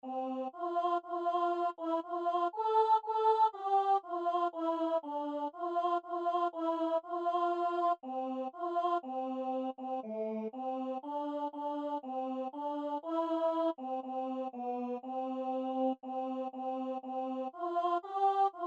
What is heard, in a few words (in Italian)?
contralti; Cantan gli angeli